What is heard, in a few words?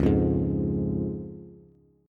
Db Major Db Bass; strummed; JGuitar